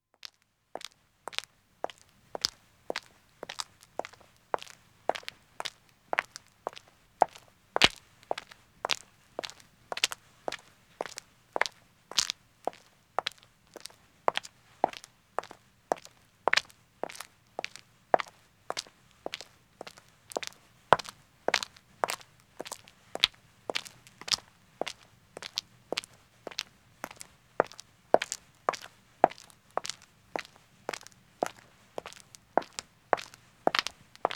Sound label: Country Road Walking 1 woman; Footsteps; BBC Sound Effects Library